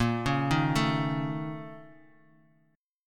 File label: A sharp Minor Major 7th Double Flat 5th A sharp Guitar; A#mM7bb5 arpeggiated; JGuitar